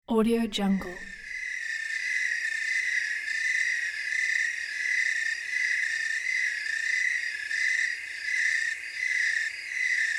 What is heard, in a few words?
night crickets